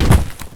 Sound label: land cardboard, footsteps